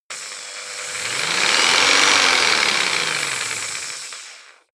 jigsaw engine medium slow medium length

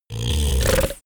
monster snoring short

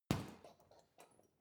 boxing punching bag hit soft; boxen

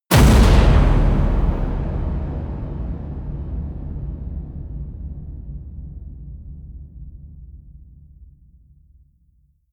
distorted, impacts; hits explosionen

